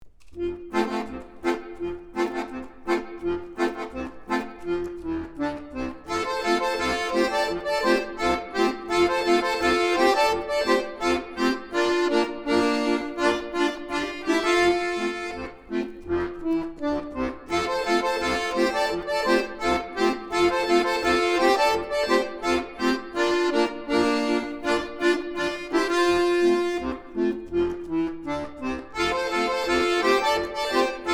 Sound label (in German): Akkordeonensemble